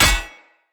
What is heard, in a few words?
Hammer Impact Shield; Weapon Impacts; Player; Combat; Tuvalkane Hammer